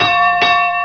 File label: ship bell